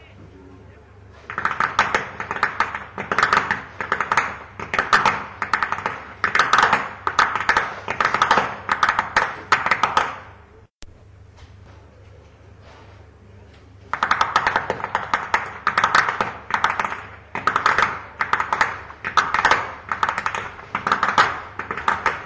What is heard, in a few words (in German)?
schnalzen; wiederlohen; saaldorf